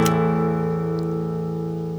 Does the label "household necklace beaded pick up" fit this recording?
No